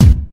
present kick sound d key

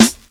focused acoustic snare sound a sharp key

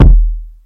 warm sounding kick drum single shot D# key

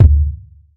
small reverb bass drum d key

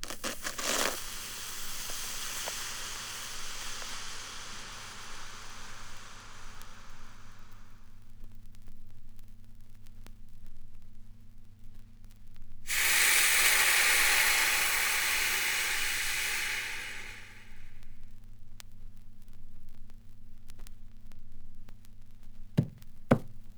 arm chopped off, water running; horror

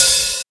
mellow open high hat sample a key

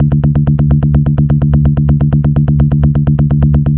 techno repetitive bass mid; instruments; loops

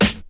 piercing acoustic snare sample g sharp key; snare drum samples

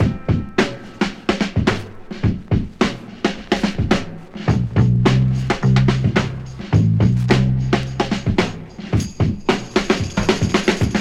107 bpm breakbeat sample g sharp key

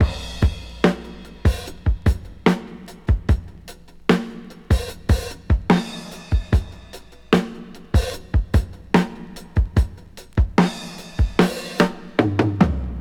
74 bpm drum groove a sharp key; Duration 0:13; Samplerate 44100 Hz

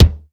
acoustic bass drum sample d key

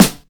good snare drum f sharp key

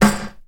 snare sound g key; snare drum samples; drum single hits one shots

